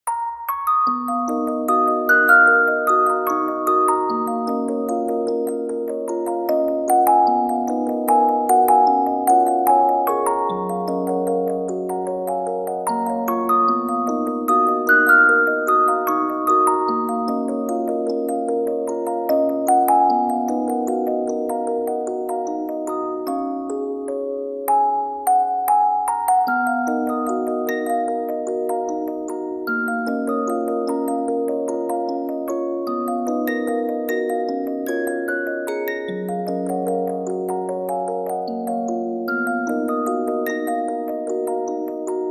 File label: applause; Chip 1.1